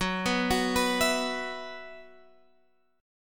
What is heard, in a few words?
Gb 7th Suspended 4th Gb down Guitar Drop D